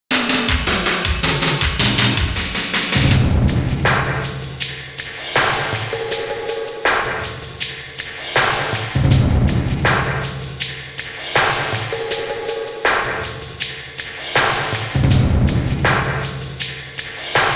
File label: Drum 'n bass mix; Rurouni Kenshin Meiji Kenkaku Romantan